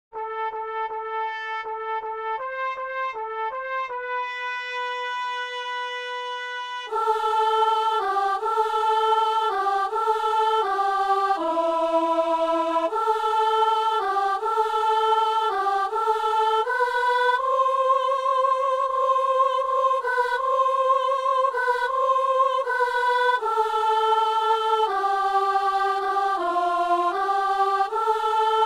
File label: soprano; Messe de Saint-Paul - Anamnèse; Version française